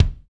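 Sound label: kick, hip hop, Roland; Northstar - Drumscapes Roland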